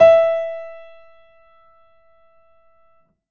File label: keyboard acoustic, acoustic grand piano